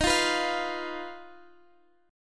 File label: Eb Suspended 2nd Sharp 5th, Guitar, Half Step Down